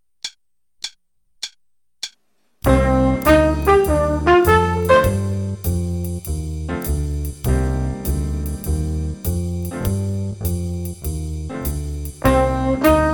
Improv Cmin with Jazz Rhythms (with trumpet, qn100)